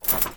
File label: build; Cosmic Rage; ships ratika